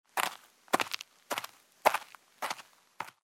Footsteps; Age of Empires III; cinematics

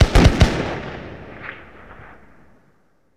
Musket Scattered Shots, Period Combat; Sony Pictures Sound Effects Series

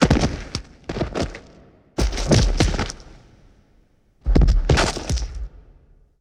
Fight Sequence, Hand To Hand Combat; Sony Pictures Sound Effects Series

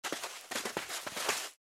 Soldier Steps; Age of Empires III; cinematics

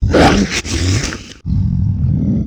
Hybrid Growl, Vocals - Monster; Sony Pictures Sound Effects Series

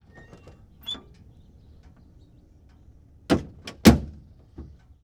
Ford Stake Bed Truck Door Open Close; Sony Pictures Sound Effects Series; Period Vehicles

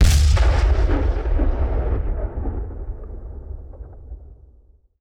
Cannon Blast Downrange Fire; Period Combat; Sony Pictures Sound Effects Series

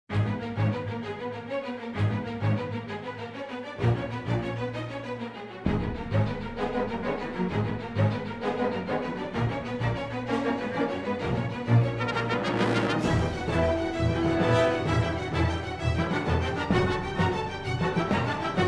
Music; Age of Empires III; cinematics

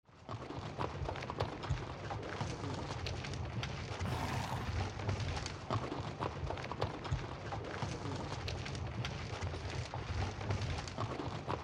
Marching; Microsoft Studios; Age of Empires III - Complete Collection; cinematics

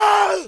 Dark Pain

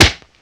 Kung Fu Old School Hit, Hand To Hand Combat; Sony Pictures Sound Effects Series

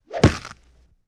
Swipe With Bone Crack, Hand To Hand Combat; Sony Pictures Sound Effects Series

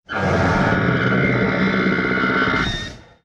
Dinosaur Design, Vocals - Monster; Sony Pictures Sound Effects Series

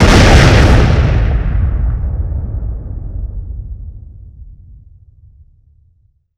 Explosion Single Large; Sony Pictures Sound Effects Series

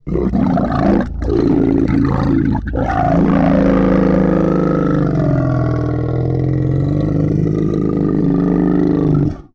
Throaty & Deep, Vocals - Monster; Sony Pictures Sound Effects Series

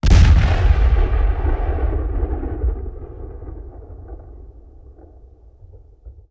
Cannon Blast; Period Combat; Sony Pictures Sound Effects Series

